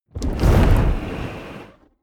organic curtain, whooshes; whooshes rises

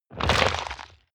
crab movement hard; bewegung; comedy-cartoon; soundpool

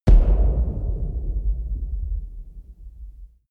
low rumble impact, percussion

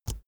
keyboard laptop enter push release

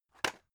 drug package drop deep; arzt

